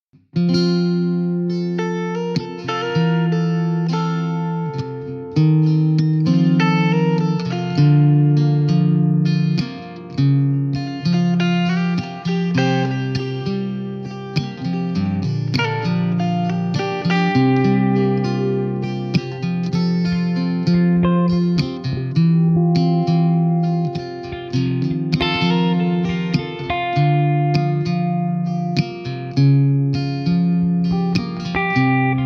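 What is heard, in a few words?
F 100 guitar cliche groove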